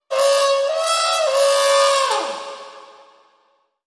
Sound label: Elephant Blues